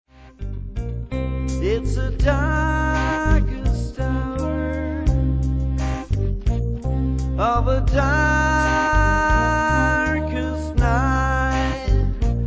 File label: midnight blues